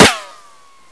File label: ric1; cstrike; weapons